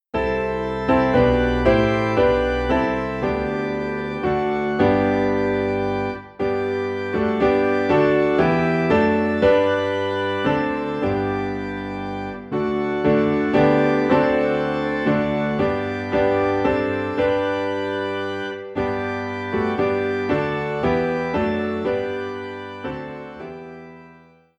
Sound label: Piano and Organ - High in G; Grace Greater Than Our Sin